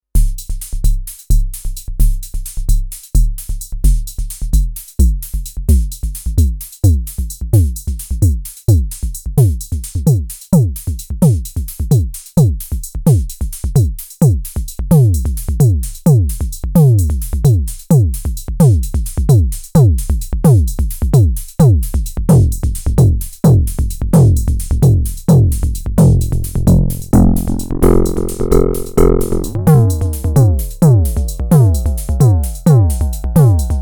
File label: Mode Machines ADX 1 Bass